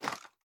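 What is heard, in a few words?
sniper reload fast arm; gmod; iw8; xmike109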